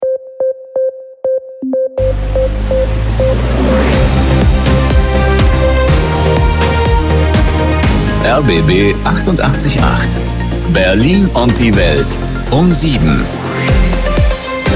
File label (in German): Nachrichten B